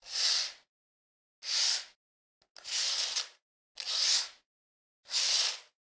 curtains1, household; NS Studios - ultra sound collection

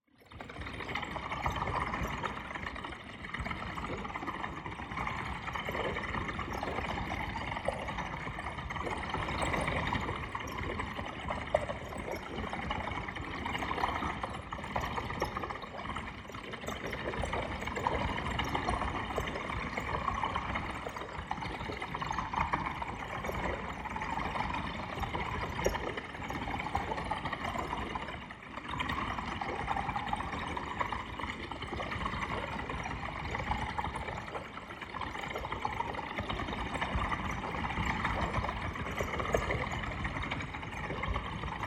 AMBIENCE Otherworldly Opening; boom library; Matter

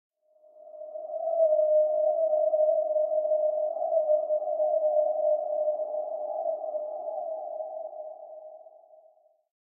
Jungle Bird Calls - Reversed - Longer and Lower; Hollywood Edge; sound designer's toolkit v2

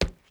boots cement; Apotheorasis

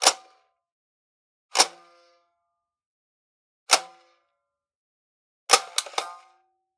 Generic Button Plastic Medium

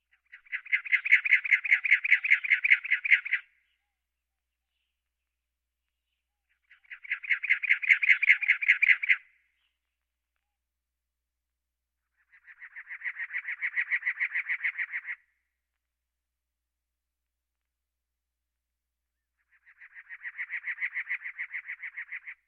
Birds

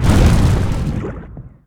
Volcano Stop Erupting; Destruction

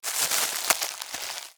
Plastic Wrap, Kitchen; 1001 Sound Effects

